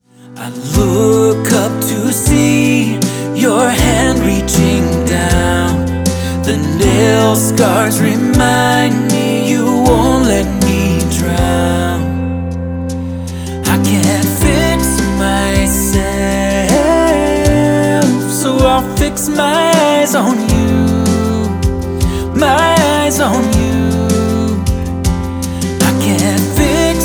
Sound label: piano